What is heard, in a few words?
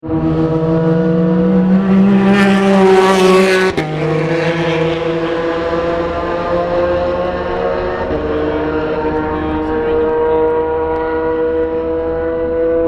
aston martin in tertre rouge